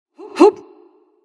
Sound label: grunt jump; smite; skadi